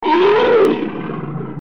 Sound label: bear growl